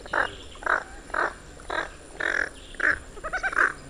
Crabier blanc, oiseaux de Mayotte; oiseaux terrestres; faune de mayotte

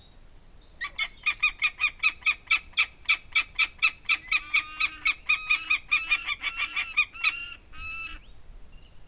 Faucon pelerin Falco peregrinus radama, oiseaux de mayotte; faune de mayotte

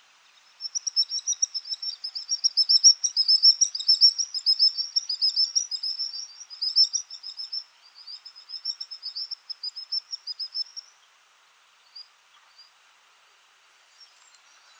Chevalier guignette Actitis hypoleucos, oiseaux de mayotte; oiseaux cotiers et marins; faune de mayotte

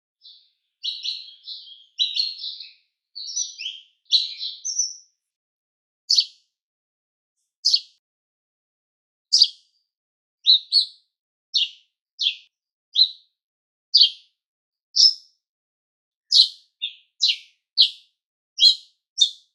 Moineau domestique, oiseaux de mayotte; oiseaux terrestres; faune de mayotte

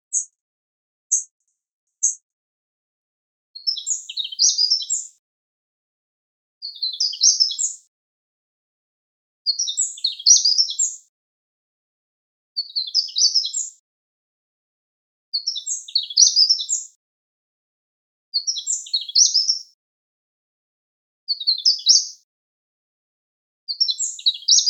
Souimanga de Mayotte; oiseaux terrestres; faune de mayotte

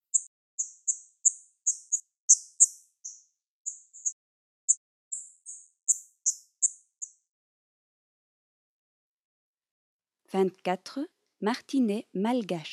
Martinet des Palmes, oiseaux de mayotte; oiseaux terrestres; faune de mayotte

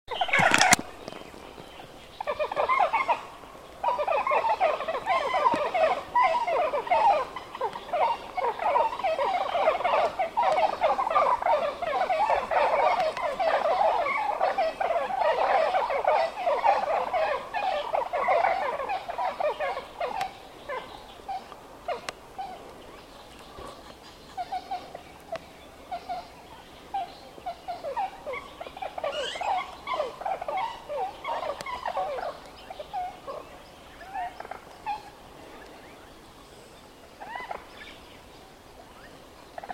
poule d'eau, oiseaux de mayotte; oiseaux terrestres; faune de mayotte

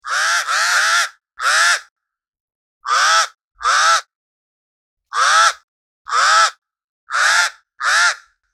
Corbeau pie, oiseaux de mayotte; oiseaux terrestres; faune de mayotte

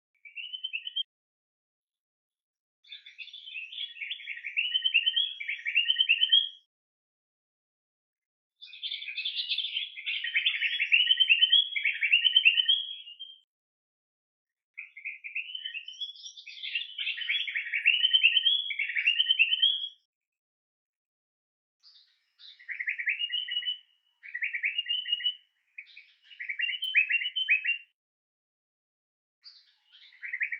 Moucherolle, oiseaux de mayotte; oiseaux terrestres; faune de mayotte

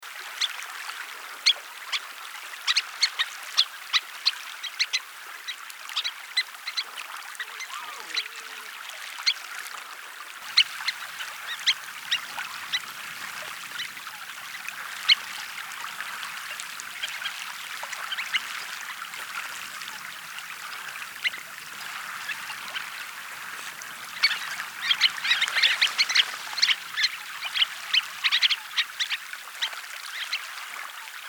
Becasseau sanderling Calidris alba, oiseaux de mayotte; oiseaux cotiers et marins; faune de mayotte

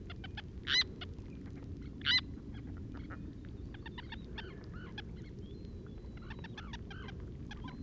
Sterne de Saunders Sternula saundersi, oiseaux de mayotte; oiseaux cotiers et marins; faune de mayotte

